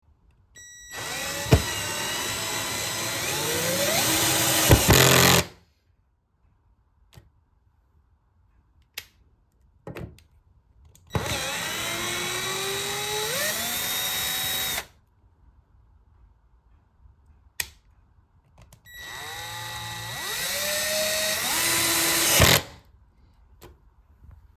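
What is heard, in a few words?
screwdriver and screw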